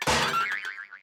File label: close oven; Dorothy And The Wizard Of Oz - Cookie Magic